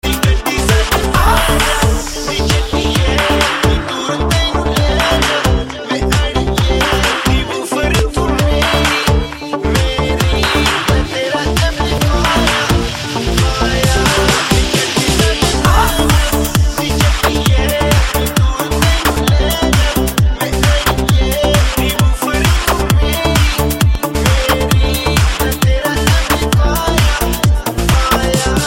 Clap Mix

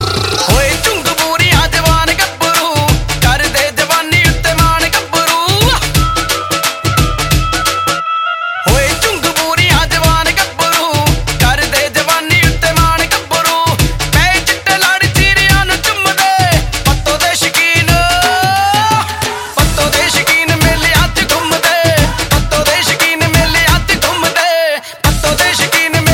Real Bhangra Tune

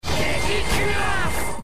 sfx; Digi-Xros

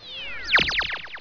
Laser Messages

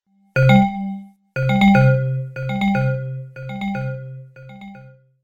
Window Error

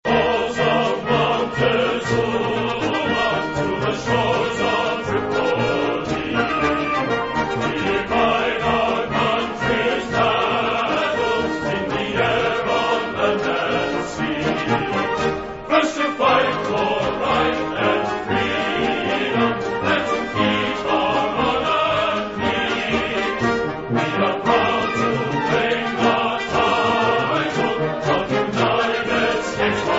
hymn; usmc

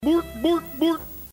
Bork Bork Bork

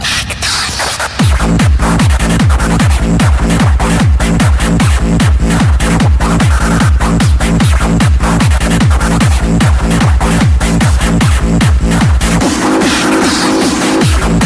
Tecktonik

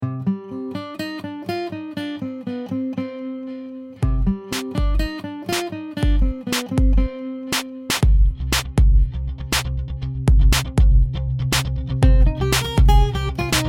Acoustic Beat

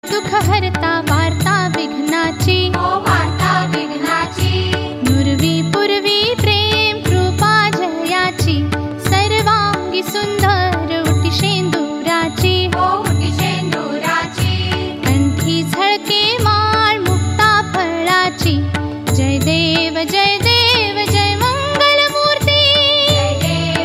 Ganesh Aarti

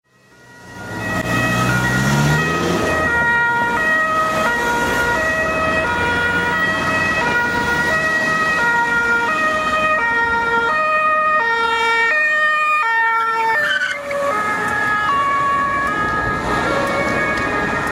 Police Sirene Funny

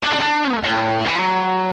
guitar riff; Flcl

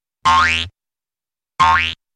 Boing

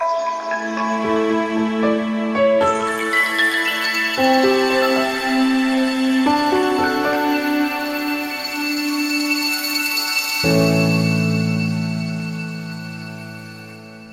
Gently Tones

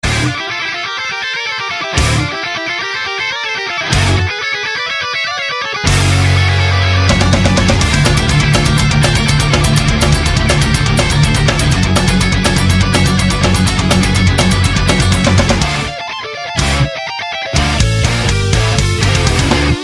Melody

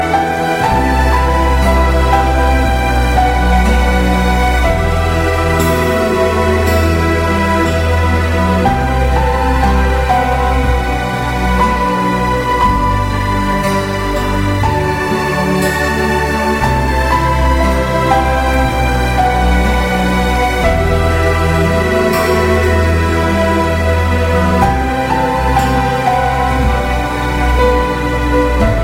Piano Sad; Naruto

